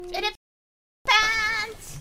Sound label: punch